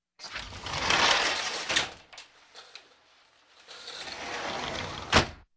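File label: sliding door on rollers; Dver na rolikah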